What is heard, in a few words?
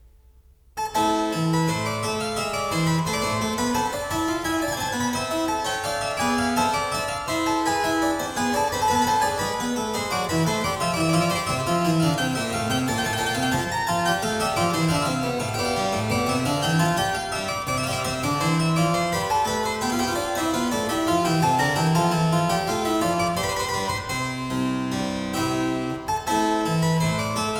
Courante; ENGLISH SUITES; English Suite No.2 in A minor; BWV 807; BACH